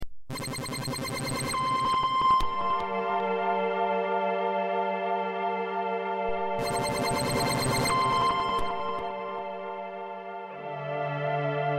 prophet 600

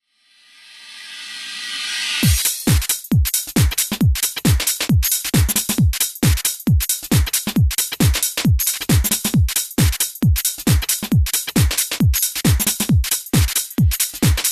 korg triton

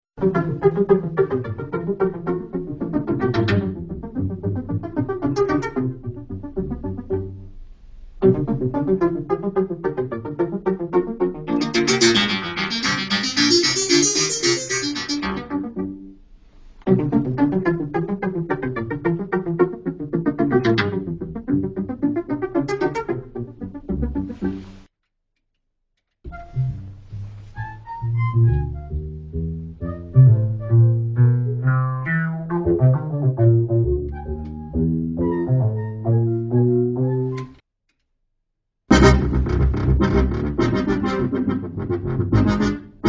prophet t 8

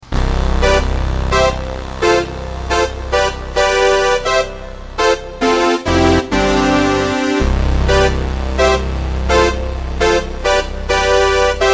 kurzweil 250 brass

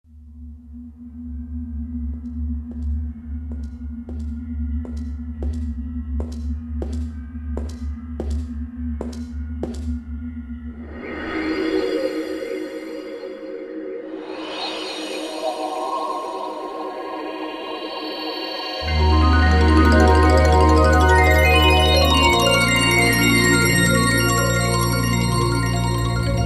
korg trinity

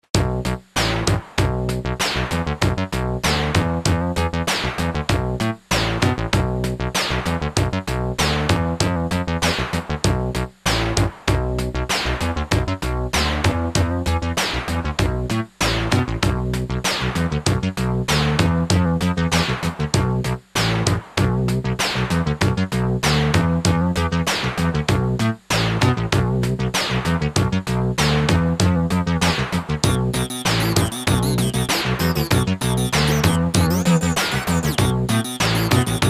casio cz 5000

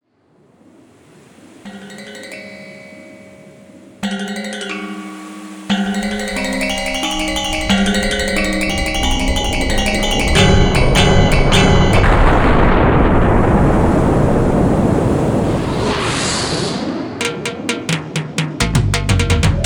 korg x5dr

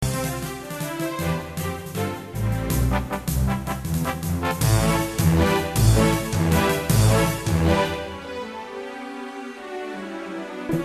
roland jv880

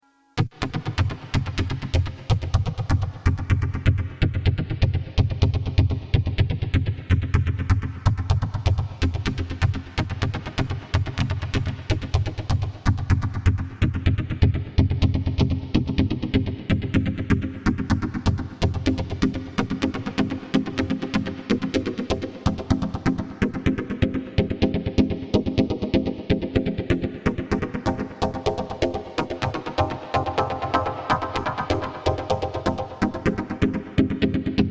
yamaha dx100

